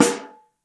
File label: SN3 Mono, Snare